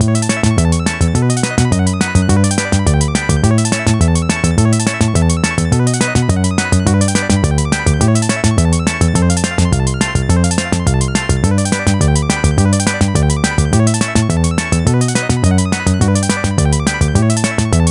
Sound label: Bonus Game Tune; the simpsons hit run; ps2